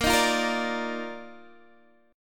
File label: D sharp Major 7th Suspended 2nd A sharp